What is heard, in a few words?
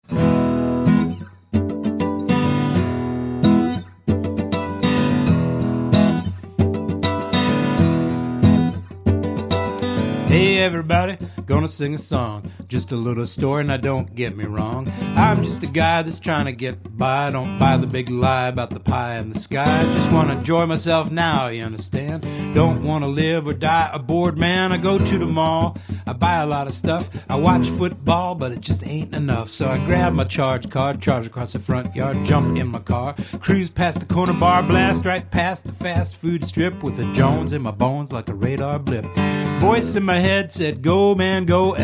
e chord